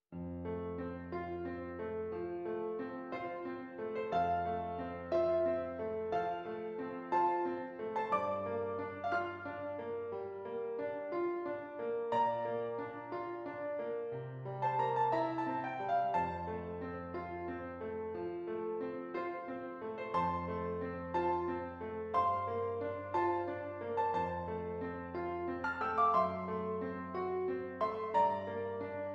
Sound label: Prelude in F Major; Autumn Prelude No. 6; Autumn Preludes